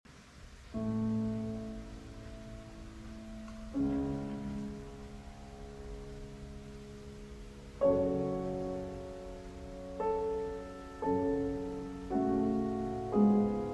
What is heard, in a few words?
Piano Sonata No. 29 in B-Flat Major, Adagio Sostenuto; Op. 106; Hammerklavier